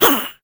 mummy death; Crypt of the NecroDancer

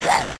skel head loss; Crypt of the NecroDancer

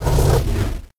elem fire death; Crypt of the NecroDancer

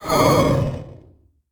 nightmare cry; Crypt of the NecroDancer

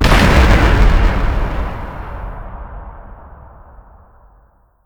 boss zone walls; Crypt of the NecroDancer

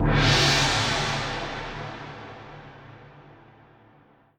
shrine on; Crypt of the NecroDancer

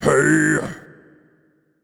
blues king attack; Crypt of the NecroDancer

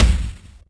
war drum low; Crypt of the NecroDancer

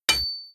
crossbow last shot; Crypt of the NecroDancer

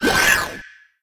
wight death; Crypt of the NecroDancer

